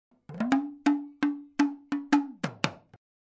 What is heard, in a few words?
drum; REMIXABLE COLLECTIONS